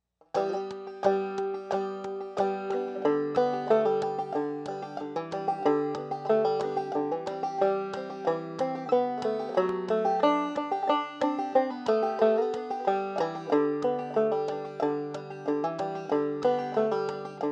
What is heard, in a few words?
jingle easy fast, banjo; Christmas